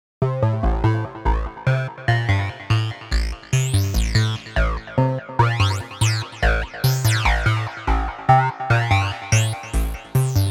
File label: Lead Midi Phrygian A, goa trance midi